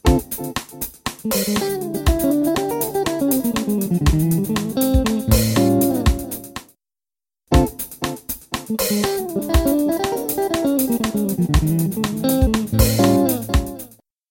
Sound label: Delay Sample